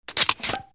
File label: pop top